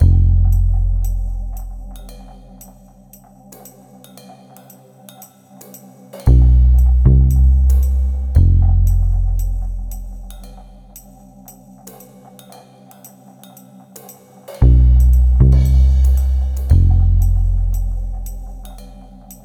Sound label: vinyl blight no trombone vibes